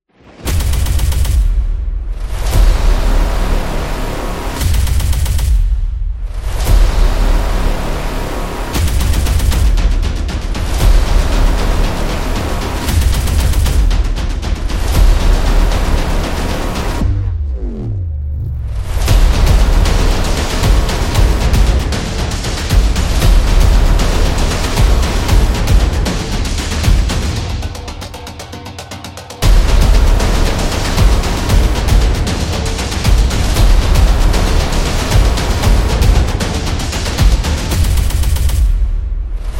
Epic Battle, war drums

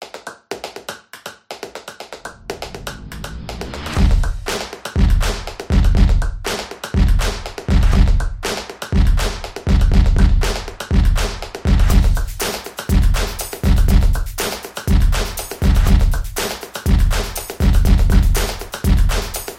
Intro Stomp

